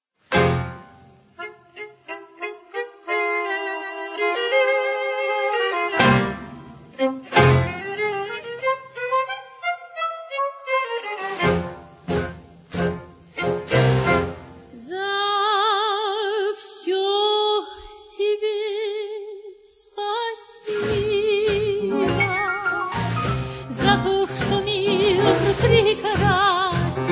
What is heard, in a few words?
tango; sovetskie